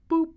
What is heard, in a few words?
UI Sounds Boop